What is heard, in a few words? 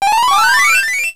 boost, pinball; tf2ware ultimate